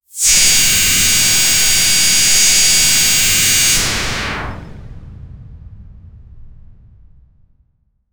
sci-fi

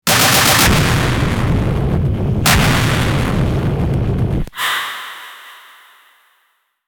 mythic gunfire stars and; mythical

